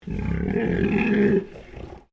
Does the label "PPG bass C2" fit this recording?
No